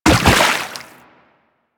Land Water, Footsteps; Enemies; Warframe; Teshin; DE

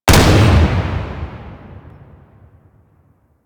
Gunblade Shot, Weapons

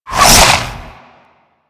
Whip Whoosh; Infested Whip

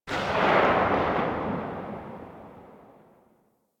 Hand Shotgun Tail, weapons; Grineer

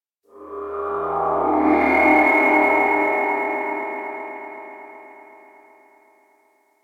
Space Exterior Dist Call, Space Battles, Ambience; Warframe

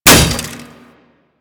Bullet Impact Metal No Whizz

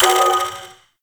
Medusa Puzzle Button Timer; Warframe; Duviri Murmur

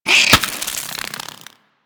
Devour Enemy Bite; Warframe; Mummy

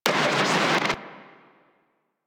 Static Out B; DE; Warframe; Night Wave

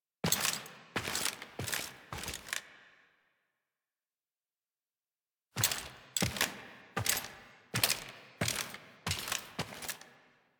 Footsteps Foley; Arthur Interrupts Cyte; Warframe; Ambience; Nineteen Ninety Nine

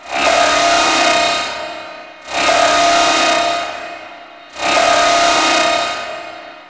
Ship Alarm; Warframe; Protea Quest

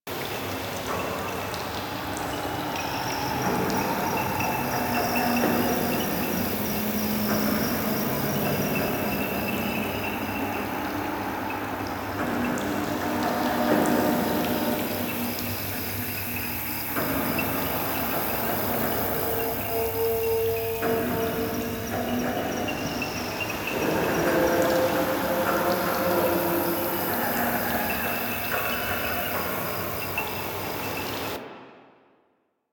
Ambience Loop, Interior; Warframe; The New War; Zariman

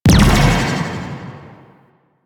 Glaive Air Explosion; Enemies; Weapons; Warframe; Teshin; DE

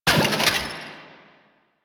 Pick Up Shield; Warframe; Caliber Chicks minigame

